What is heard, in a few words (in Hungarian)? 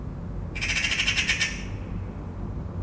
szóló angyalföld, szarka; termeszetben; allathangok